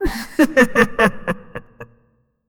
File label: create a realistic and, clown